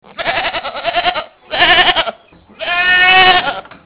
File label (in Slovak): koza; zvierata; domace